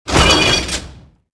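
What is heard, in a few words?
footstep, atst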